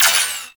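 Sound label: submachine gun Shot; Warframe; Tenno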